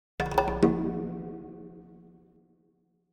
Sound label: Jungle Drums Triple Hits - High Part; Hollywood Edge; sound designer's toolkit v2